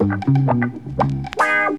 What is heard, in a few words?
WAH FILL 119, drum; BREAKS